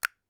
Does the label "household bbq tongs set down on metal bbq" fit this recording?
No